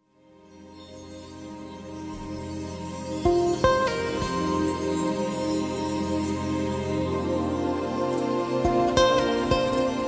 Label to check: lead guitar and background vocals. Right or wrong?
Right